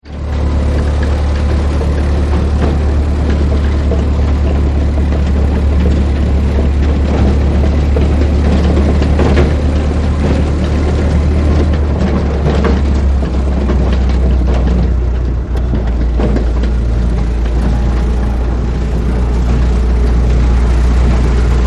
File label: Truck with Canon; Gun Shot Sound Effects